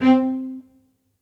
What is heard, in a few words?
Short Sul Tasto - String Quartet; Instruments; Individual; String Quartet by Spitfire Audio